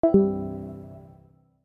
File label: user leave sound; discord